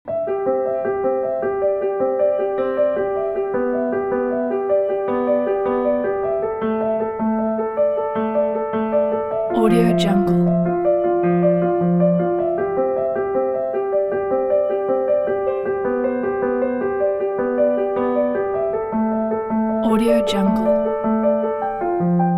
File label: sentimental piano